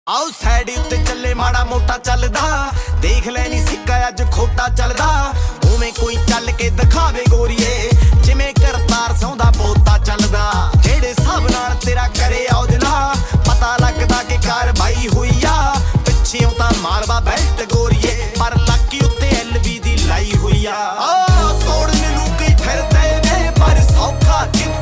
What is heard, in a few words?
Punjabi Beats Ringtone